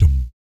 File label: BS DMM, Vocal Planet Jazz & FX; ILIO; BASS DUMMS